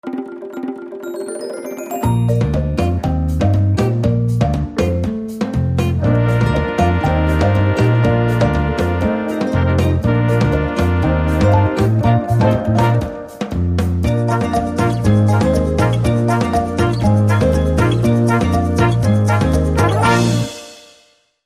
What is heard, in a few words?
Trailer Music Caribbean